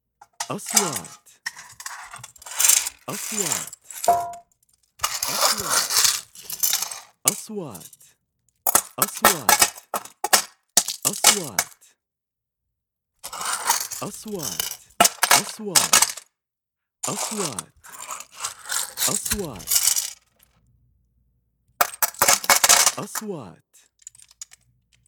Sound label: plumbing screws metals scrap bolts irons